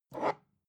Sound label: glass bottle pick up